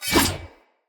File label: Dagger Whoosh; Weapons; Dendrit